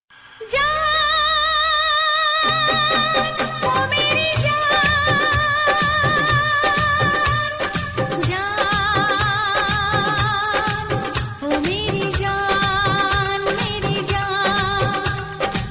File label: Old Hindi Music